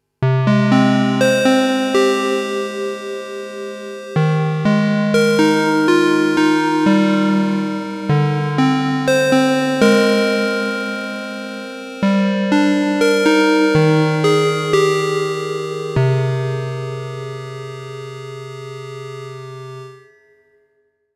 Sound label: Juno 60 Melody CHORUS; LXP-1